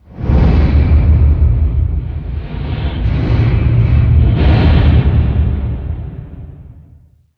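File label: underground ambient banging; blackforest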